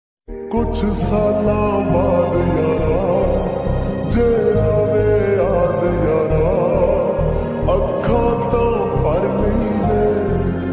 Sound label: slowed reverb lofi remix ringtone sad ringtone